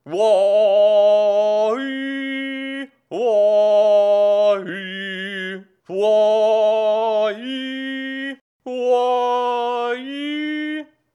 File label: sing